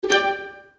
stop bonus; Beauty Fairy

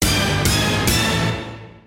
free games; Great Book Of Magic; Wazdan

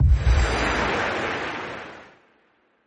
gambling wings; games; Fenix Play 27 Deluxe; Wazdan

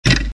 reelset stop; Flamenco Roses

